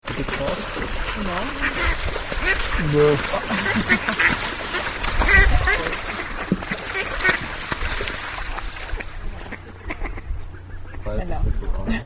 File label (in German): enten; schweden 2000